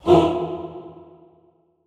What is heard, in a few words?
HOO E2, Classical Choir, SHORT HOO; Best Service; Extended Classical Choir